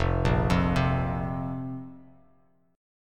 F Suspended 4th F, Bass Standard; Fsus4 arpeggiated; 1,1,3,5; JGuitar

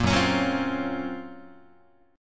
Gb Major Sharp 11th Gb Guitar; GbM#11 strummed; JGuitar

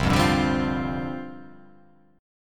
C Suspended 2nd Suspended 4th, down; Csus2sus4 strummed

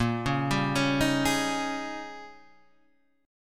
Eb 11th Bb

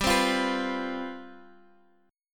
C Major 7th Suspended 4th, G, guitar chord

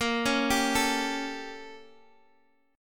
A 7th A sharp, chord; A7/A#; arpeggiated; JGuitar

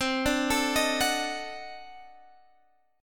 D 7th Flat 9th C, chord

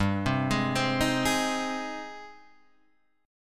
G sharp Major G, guitar chord; arpeggiated; JGuitar

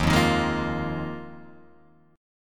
Eb Suspended 2nd Suspended 4th, Guitar, Open F; Ebsus2sus4 strummed; JGuitar

